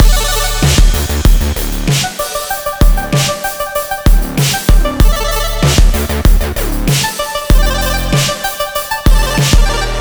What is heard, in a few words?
mus win loop